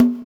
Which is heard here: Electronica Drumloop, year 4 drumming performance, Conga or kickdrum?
Conga